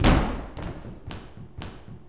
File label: 115 STOMP, SLAP STOMP; Spectrasonics; Vocal Planet; Blues Soul